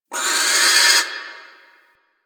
Owl Critters Vocal Alerted; Ambience; Duviri; Warframe

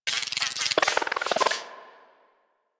Robo Fish Medium Caught; Venus

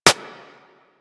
Bullet Impact Generic; Surface

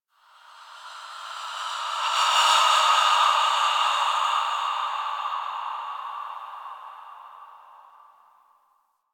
Breaths; Warframe; The New War; Zariman

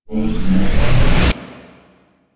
Boss Laser Charge Stereo; Warframe; Weapons; Corpus

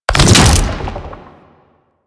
Octopede Head Spin; Warframe; Man In The Wall

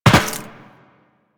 Land Metal Perforated, Footsteps; Warframe; Tenno

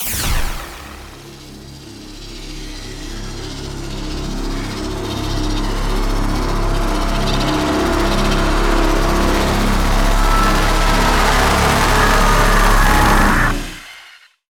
Charge Up; Quests; Sacrifice; Forest Night

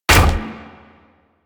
Full Draw Shot, Weapons; Warframe; Infested; Inf Cernos

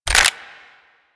Reload, Weapons; Kraken

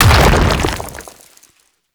arm proj by explosion; Warframe; Man In The Wall

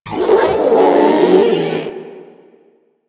Strike Scream; Enemies; Abilities

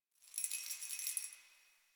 Keys Jingle

